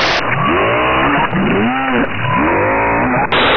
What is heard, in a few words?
heavy alert, zombie